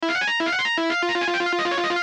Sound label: singing